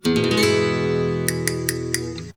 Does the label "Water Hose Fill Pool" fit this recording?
No